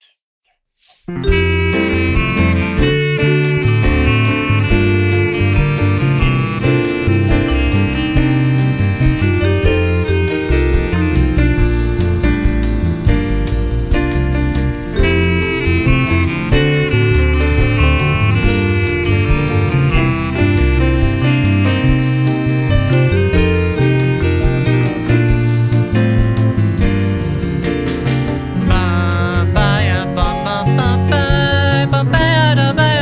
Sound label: BOSSA; REGRETEVATOR OST